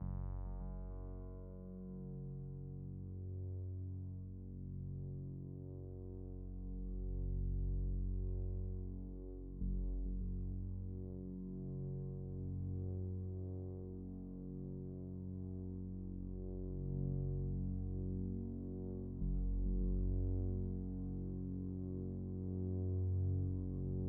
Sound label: Ambiance Deep Coma Super Smooth; Synapse